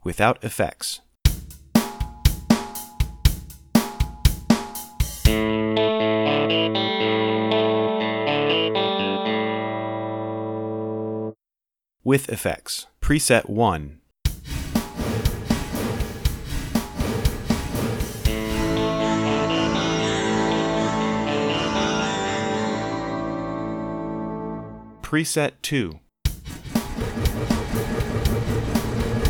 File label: octave reverb; effects; orb7000; Mixcraft